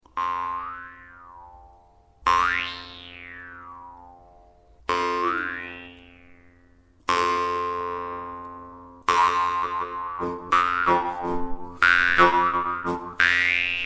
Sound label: russian vargan